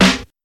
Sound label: snare drum sound b key, steel snare drum sound tuned to the B note